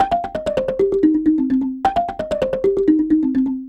Marimba 130bpm C, African

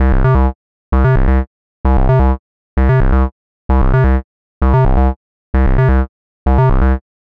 130 C bass, bass loops, trance

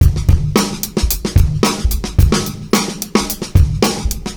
Live Beats, R&B Drums

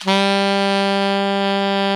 G low, alto sax; Giga Samples Collection; Brass

